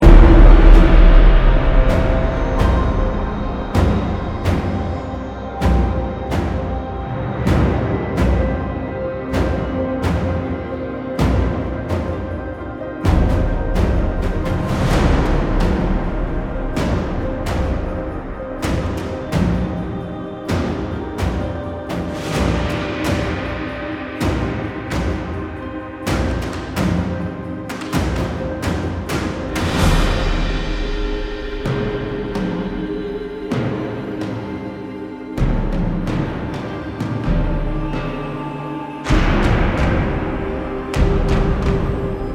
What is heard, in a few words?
Forest Combat Intensity